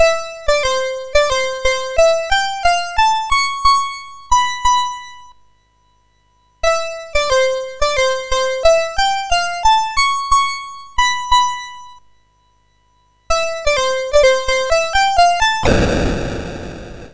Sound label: Exploding Piano, MOS6581R4